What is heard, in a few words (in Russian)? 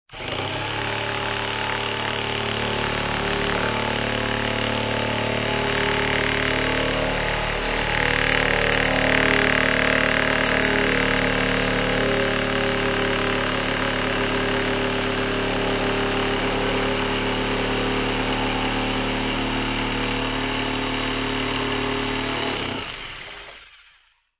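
электрическая пила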